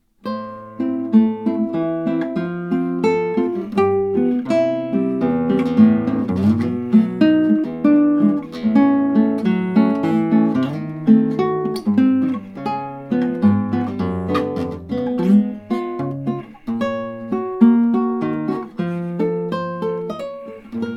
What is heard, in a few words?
prelude